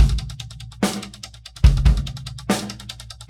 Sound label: drum loop 4 bar 4-4, 145